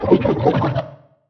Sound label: Stalker Hit